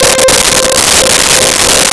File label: Brightness6 C5; Nintendo; July 2020 Gigaleak